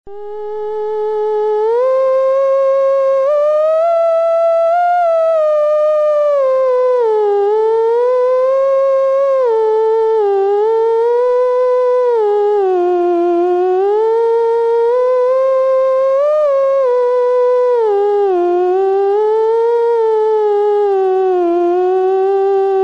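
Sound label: upper register, theremin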